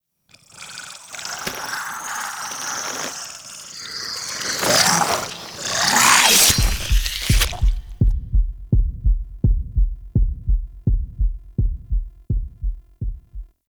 Chair; Liset Alchemy Lab

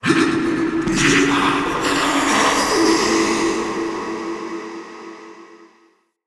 Ghost Soldier Death, Vocals; Enemy; Wandering Ghost Soldier

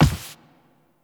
Zariman Hub Apartment Foley; Warframe; Cinematics

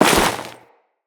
Crewman Step Ice, Footsteps; Enemies; Corpus

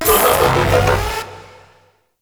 Swarm UI Scanner Functional; Entrati

